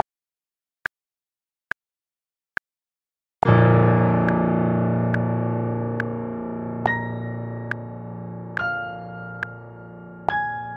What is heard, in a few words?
Star Dreams intro with metronome